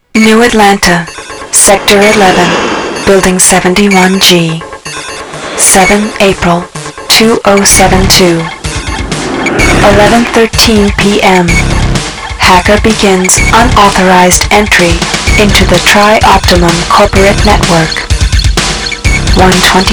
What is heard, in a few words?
Intro Ensoniq AudioPCI